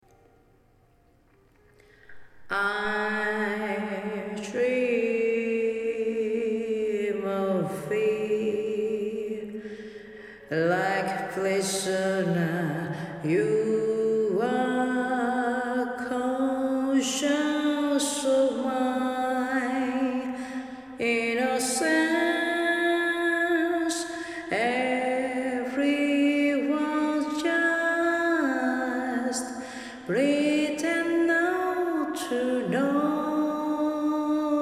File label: Miss Stiletto Heels Acapella; Deadly Premonition